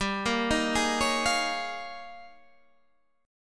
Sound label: D sharp 11th G